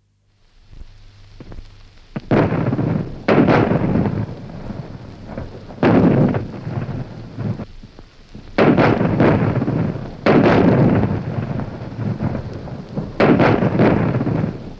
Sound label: Sound Of H, Sound of heavy gunfire; From 78 disc; Battlefield; BBC